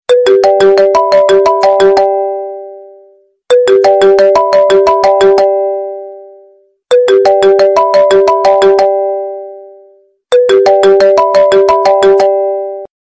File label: ringtone